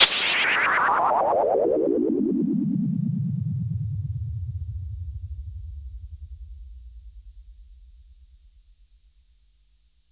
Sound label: SYNTH FX